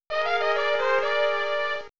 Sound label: Weapon Break